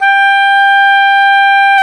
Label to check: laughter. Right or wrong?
Wrong